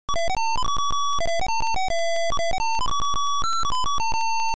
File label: Title Beeper; Back To Skool